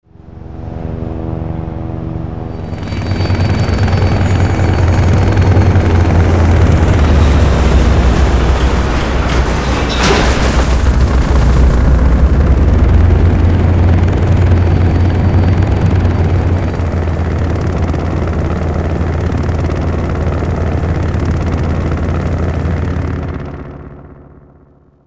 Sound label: drop; buraq